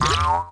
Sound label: Wrong